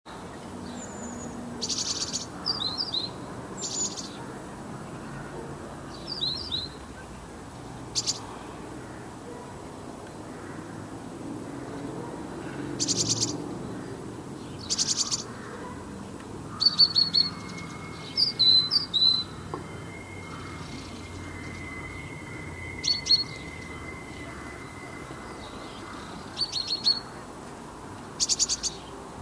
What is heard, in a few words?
birdsongs